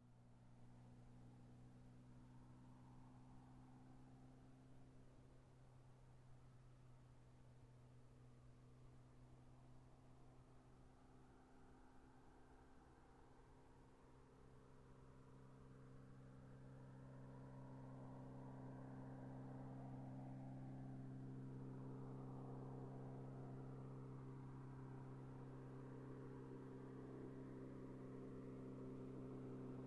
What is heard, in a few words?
Motorcycle passes by